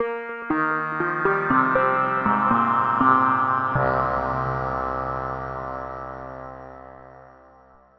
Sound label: Distant Land, Synth Textures; Sonic Foundry (Sony Creative Software); The Electronic Music Manuscript Collection